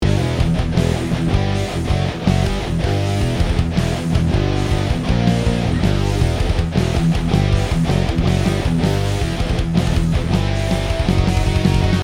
Riff Idee Tutti

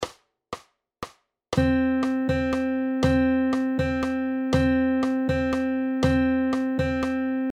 Duolen 34; two notes are played within three beats

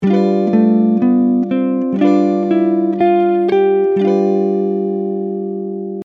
Mixolydisch; G Mixolydian with G Major Chord

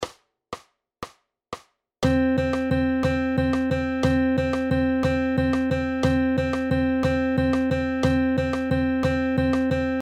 Viertel Triolen

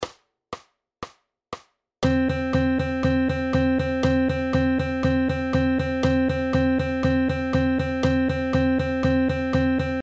Achtel Noten; A 'normal' 8th note rhythm, as described above, sounds like this without swing phrasing

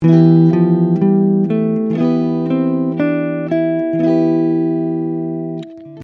Phrygisch; E Phrygian with E Minor Chord

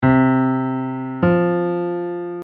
Intervalle, eartraining for guitarists

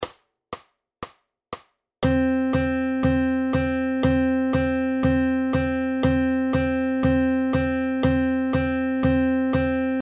Viertel Noten

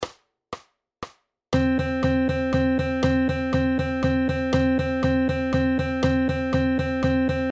Achtel Noten 34, Eighth Notes in 3/4 Time

